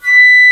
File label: Flute mf; Digital Sound Factory Virtuoso